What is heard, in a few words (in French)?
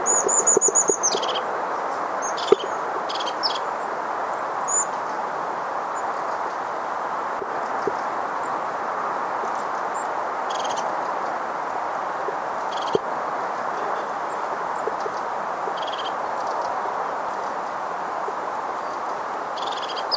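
mésange huppée bleue; chanfro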